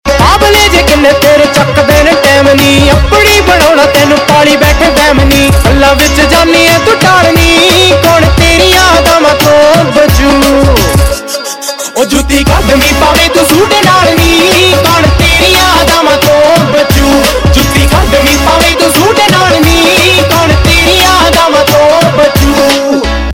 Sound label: Ringtone, Punjabi